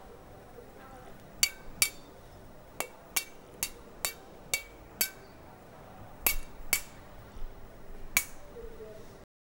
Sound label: grabbing the metal bar ou, training